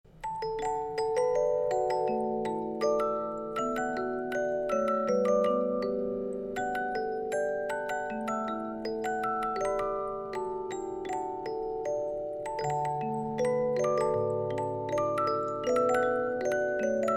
music box; SANKYO

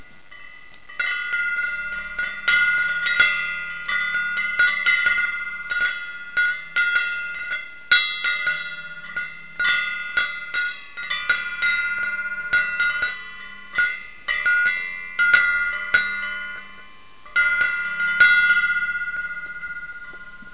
beacon; seagifts

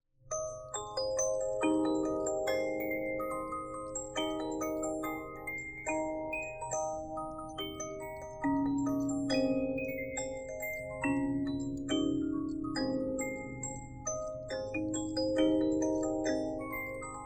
Magic Flute, musicbox

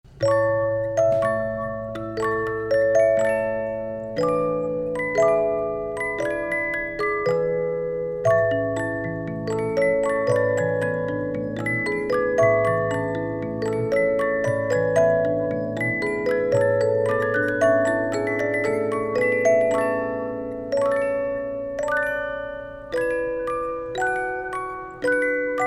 Eine Kleine Nachtmusik Allegro, music box; SANKYO

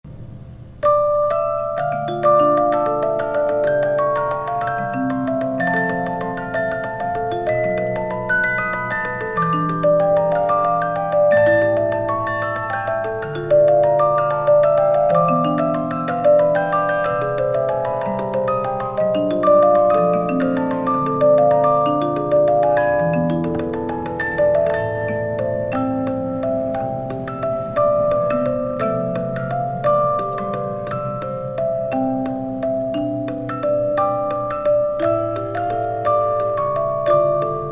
piano concerto 72 note, musicbox

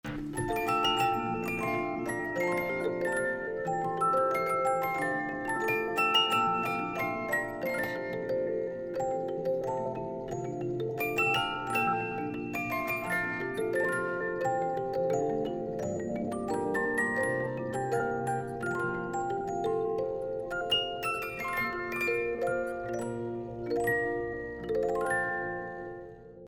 SANKYO, music box

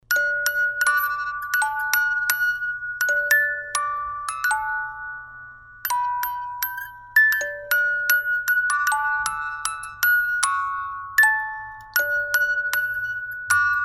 Jingle Bells, music box

